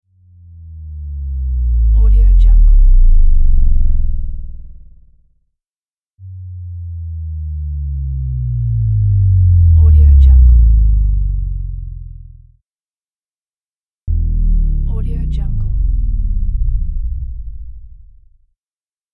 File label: bass drop